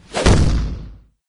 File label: attack no vo, wraith; doom3